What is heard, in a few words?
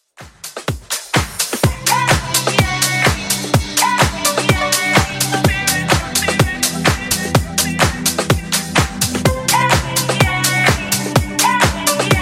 Funk Vocal Edit Clean 126Bpm